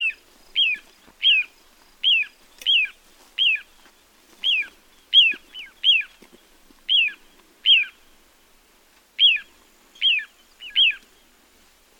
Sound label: Little Ringed Plover